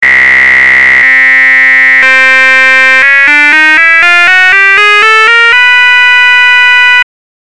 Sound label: linFM